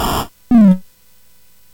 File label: Ducks Ahoy, CSG8580R5